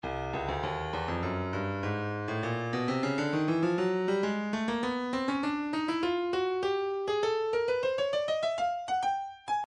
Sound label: breakbeat 100 bpm; big j; Stylus Breakbeat Midi Files